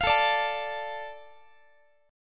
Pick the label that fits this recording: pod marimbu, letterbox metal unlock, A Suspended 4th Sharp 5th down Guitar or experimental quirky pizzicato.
A Suspended 4th Sharp 5th down Guitar